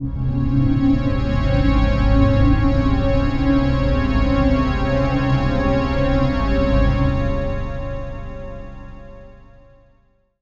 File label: Darkness Atmos, Dark Atmospheres; Zero-G Sound Sense Grime Scene